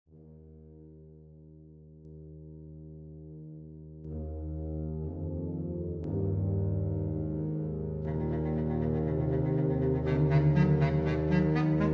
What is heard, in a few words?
interrupted sleep